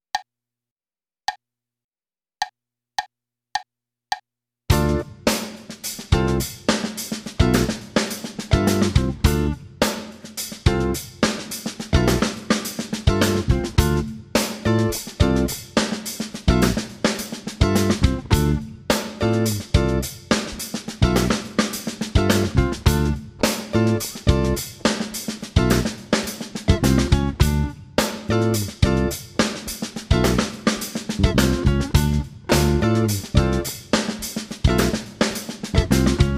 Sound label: funk groove; bergeron guy